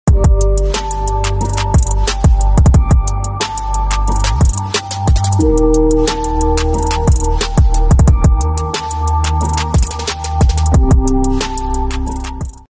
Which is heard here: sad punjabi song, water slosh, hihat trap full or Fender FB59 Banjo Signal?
hihat trap full